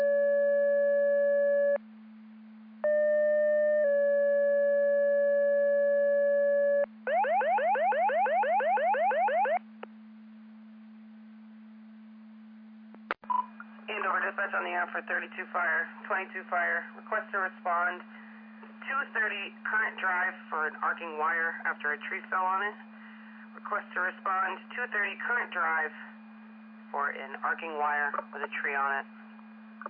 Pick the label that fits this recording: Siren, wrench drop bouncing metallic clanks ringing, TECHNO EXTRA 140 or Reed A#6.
Siren